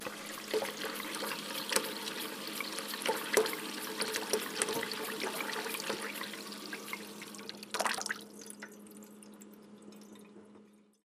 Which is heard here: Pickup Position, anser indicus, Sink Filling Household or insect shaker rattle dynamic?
Sink Filling Household